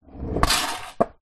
skateboard scrape back on ground to slow then down